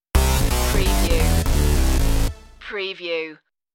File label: smash tv stinger